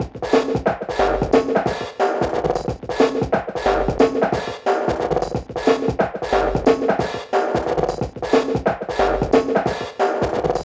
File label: jazztrain echo 090bpm; Zero-G; Downbeat Dance Grooves